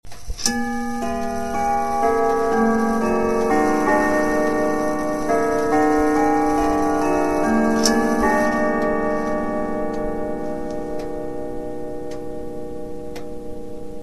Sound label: St Michaels half hour, grandfather clock; Grandfather Clock Co.